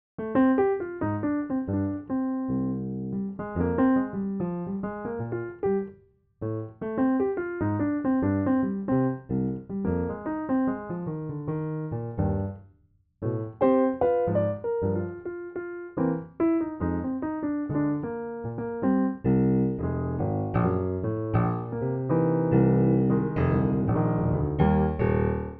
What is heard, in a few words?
Blues etude; pianoteq8; modeld